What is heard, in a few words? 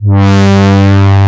brass, synthesizer; AKAI S6000 CD-ROM - Volume 1